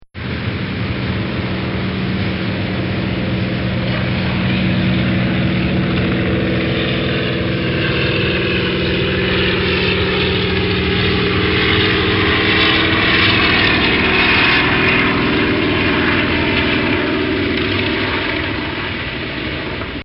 Sh 3 Seaking Helicopter Hover; Helicopters

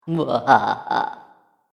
Witch Cackle, Evil Laugh; horror sound effect; Andrew Fleming; Twistedtracks

